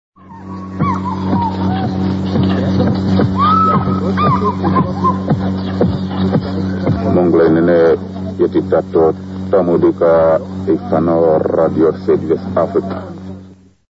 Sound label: interval signal; South West Africa; SWABC; R Ovambo; radio ovambo; c1985